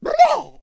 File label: Firework Archer Death Vocals